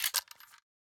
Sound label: shotgun reload